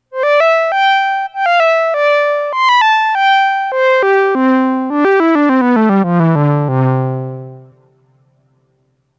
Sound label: flute; gmedia; oddity; ARP Odyssey